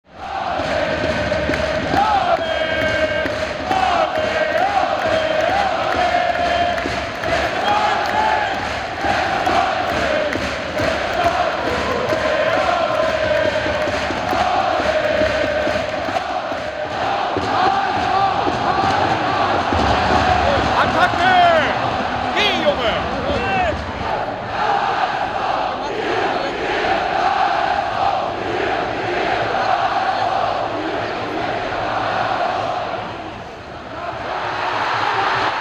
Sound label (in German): gesaenge